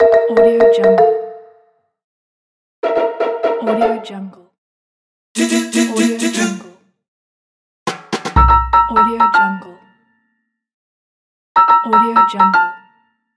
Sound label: characteristic audio branding collection ident